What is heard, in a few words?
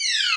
beepdown; Doom 3